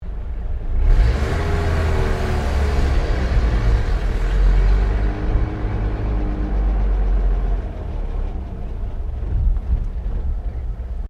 CAR HOLDEN FJ ACCELERATION FROM IDLE, Classic Australian cars